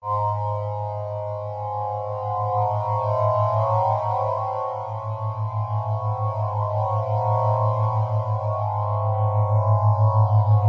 harmonic waverlings; Atmospherics

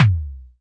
SC40 BD, Pearl SC-40 Kicks

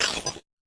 fx drum, Electro FX

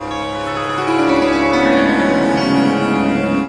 Bilas Todi Swar; Far East Drones and Plucks

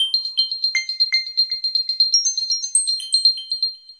120 super high melody ringing, world loops

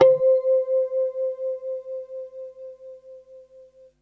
harmonics, Guitars

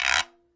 arabic perc, Arabic Percussion Kit; ETHNIC and WORLD PERCUSSION

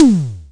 Simmons SD-5, drum machines

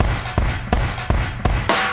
tribal rock, drum loops and breaks

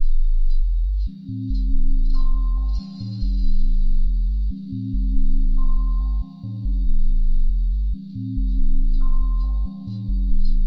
lab atmosphere loop; instruments single samples; dr bonkys; Pads

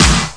EMU SP 12, drum machines; Kult

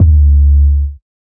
Deep Second 808; Khezie 808s

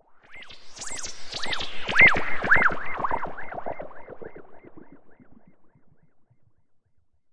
ufo echo probe; Computery or Science Fiction

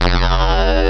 oscillating, synth

